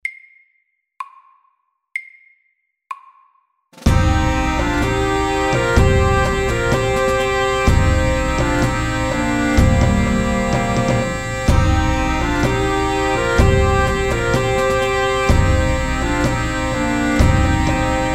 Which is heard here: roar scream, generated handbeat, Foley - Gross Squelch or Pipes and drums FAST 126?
Pipes and drums FAST 126